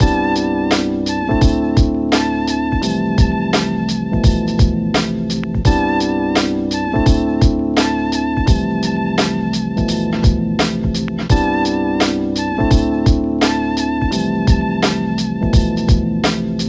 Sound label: 80 boom bap vibe 2 vocals